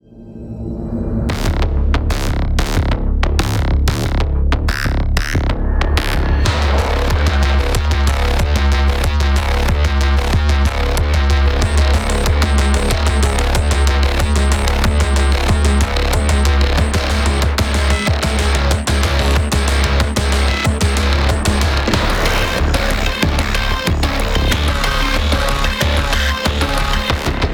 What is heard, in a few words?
start 186bpm; Doom